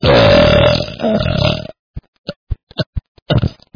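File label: nausea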